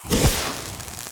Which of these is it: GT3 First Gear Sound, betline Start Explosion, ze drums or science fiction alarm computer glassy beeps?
betline Start Explosion